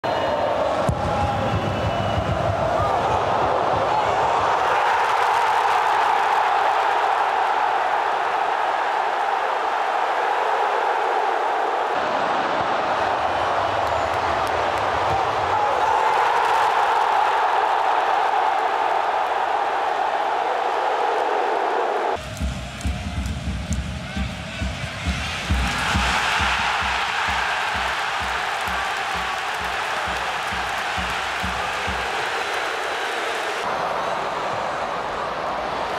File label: siuuuuuuu